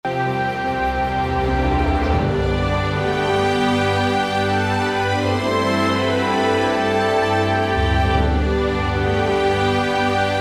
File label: Flying Over The Unknown Romantic 80BPM; Mixology Vol 1